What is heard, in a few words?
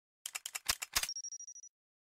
defusing